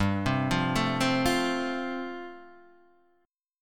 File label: G 9th Suspended 4th, Guitar, Standard; G9sus4 arpeggiated